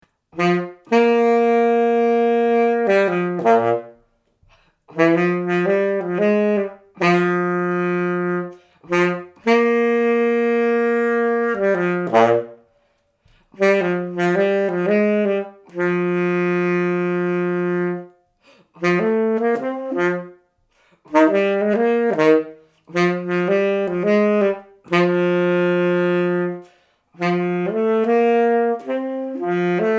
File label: Sax Ringtone